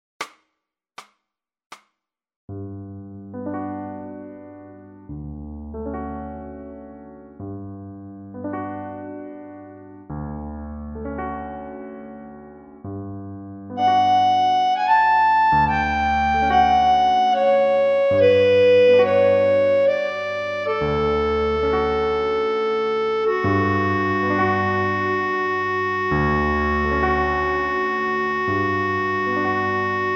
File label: piano solo referencia clarinet